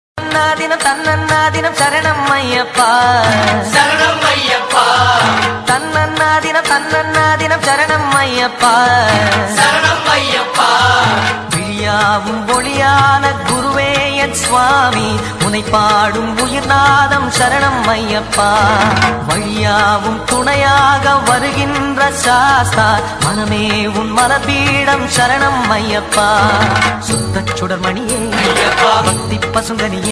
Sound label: Ayyappan Swamy song ringtone tamil music; pistha